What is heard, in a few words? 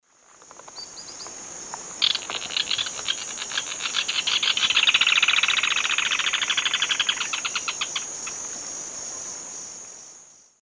Synallaxis propinqua, song; S. America; Eastern Lowlands